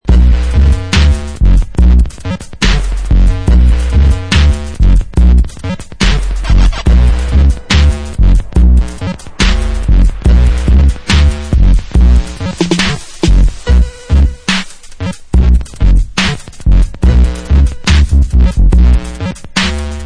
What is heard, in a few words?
Instrumental